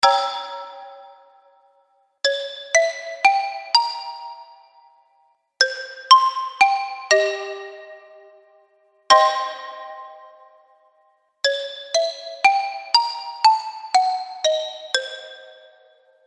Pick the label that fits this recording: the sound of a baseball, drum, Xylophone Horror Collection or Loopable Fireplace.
Xylophone Horror Collection